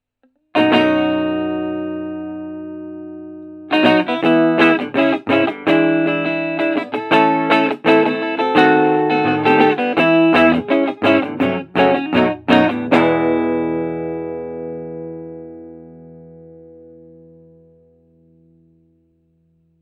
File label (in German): chords mit